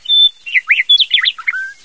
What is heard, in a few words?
lark